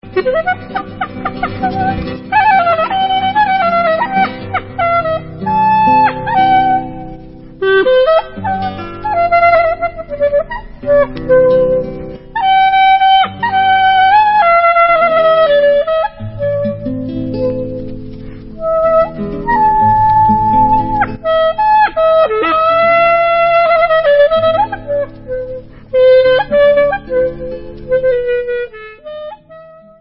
Instrumental

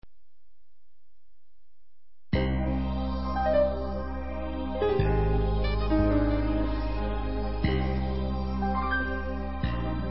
Instrumental

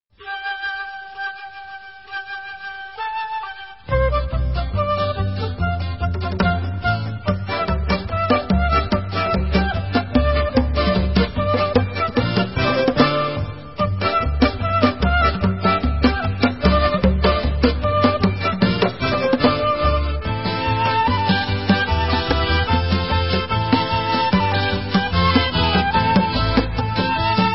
Debka Instrumental

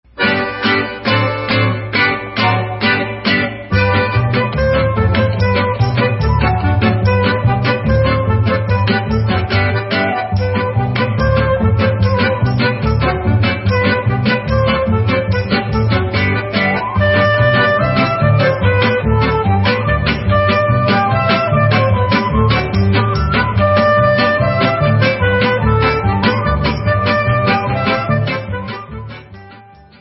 Russian Instrumental

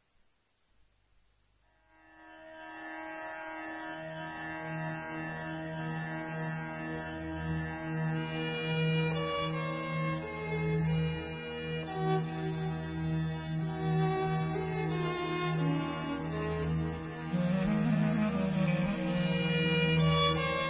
Instrumental

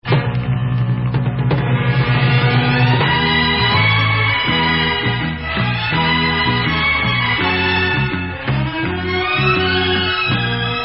Vocal

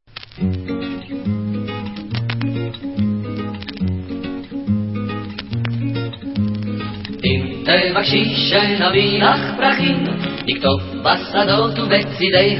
Instrumental

